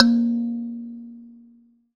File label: kalimba a#, Thumb Piano; ambient; Chillout